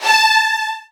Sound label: Virtuoso Strings; ILIO; Kirk Hunter Virtuoso Strings VOL-3; 90 s Sample CDs; LRGE STR HRD